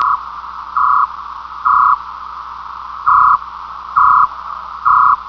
clover, Amateur CLOVER

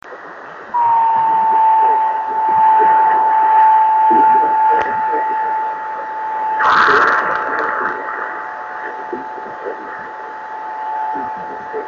26555KHz, drift net beacons; callsign of FISH; 26.555 MHz

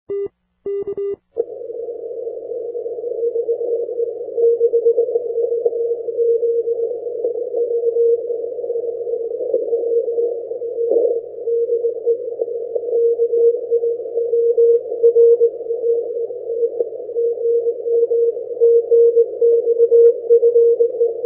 80m cw; IK1RLI

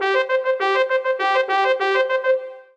alert horn; OetziAlarm

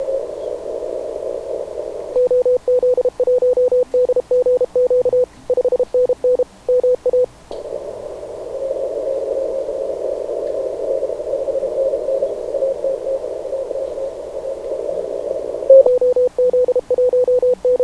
20M faint cw; 2009 arrl cw

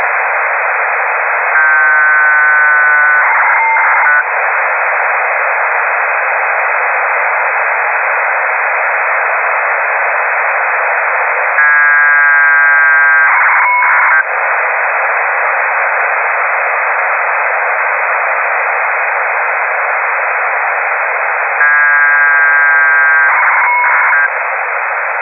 1600hz, satelite

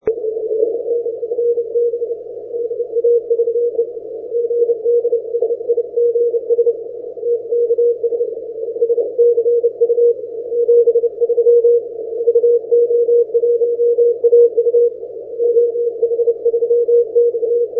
80m cw; OH3XR; Marko coming through well at his sunrise.

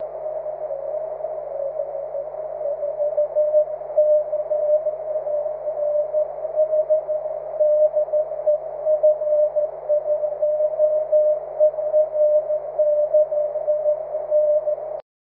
cw; f1pyr

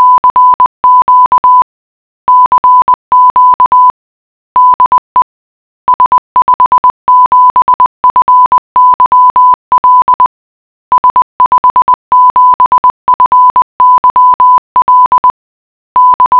morse k; fyl

